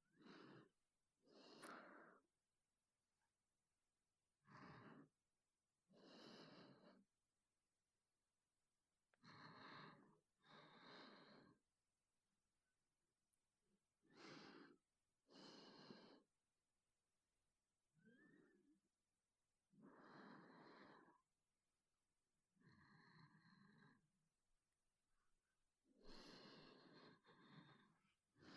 右耳 吐息 ループ音源; ハクの吐息&寝息; 環境音なし

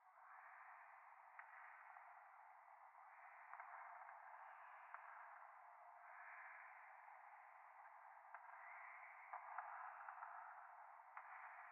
ゴースト 右耳密着吐息 電車

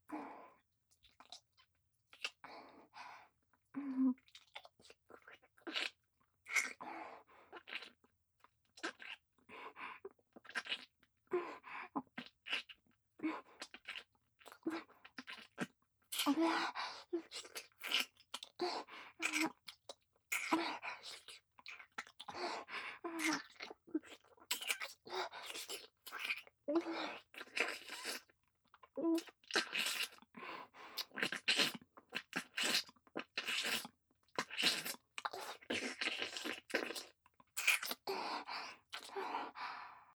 左斜め下・フェラ・普通; リーシャ

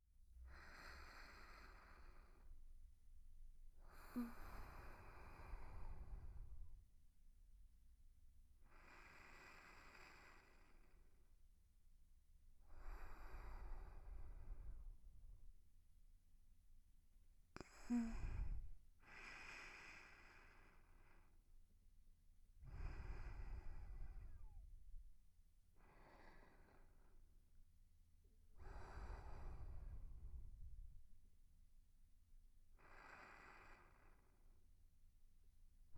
寝息; SEなし

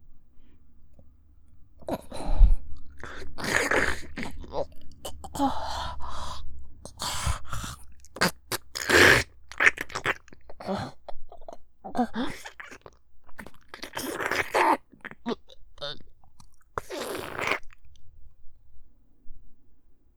フェラ音(嗚咽しながら喉奥フェラ)

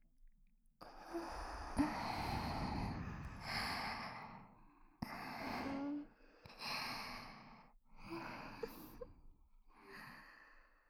甘い吐息や鼻息で甘い吐息ですやすや

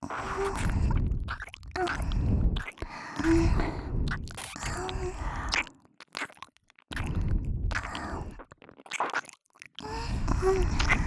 吐息交じりの両耳キスループ; 耳元ループ; オナサポ

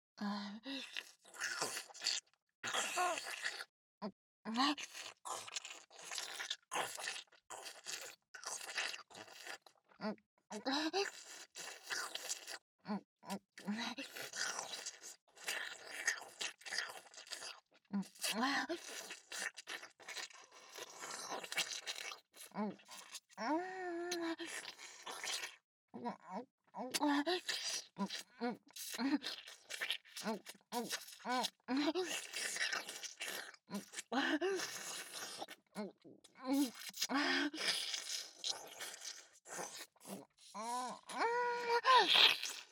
フェラ

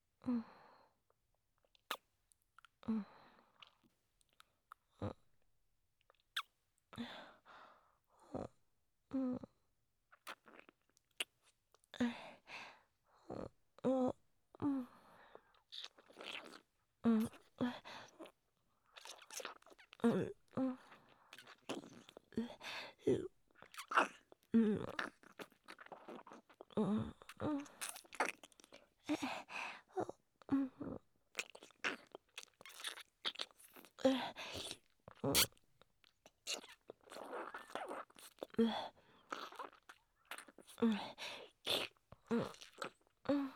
キス; SEあり

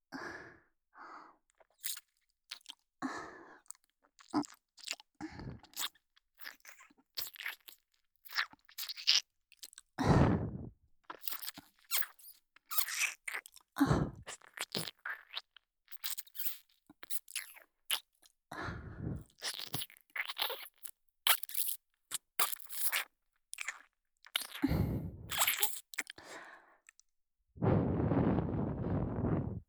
耳舐め, ASMR; SEあり

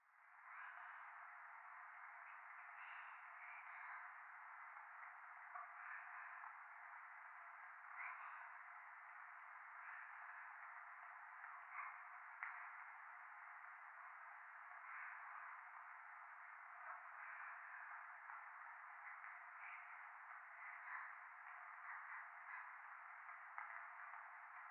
教会 左耳密着処女喘ぎ 雨

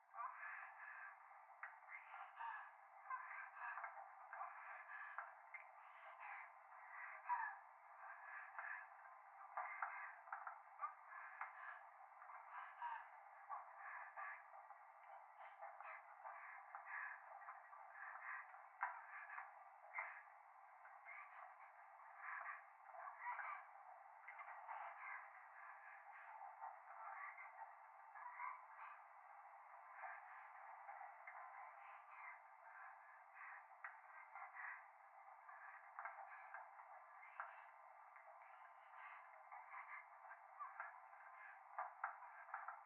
風呂 右耳密着処女喘ぎ 電車

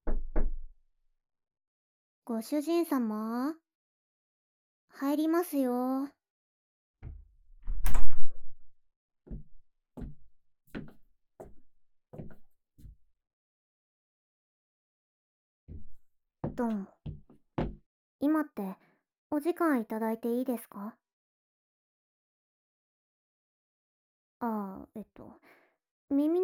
メイドさんの手袋指耳かき、綿棒、梵天

